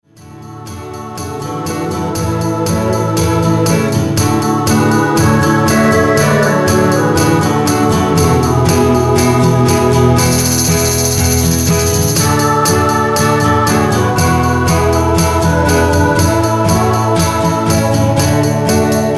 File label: Mixed choir